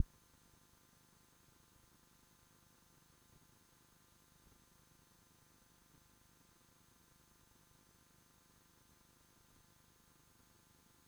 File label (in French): guitare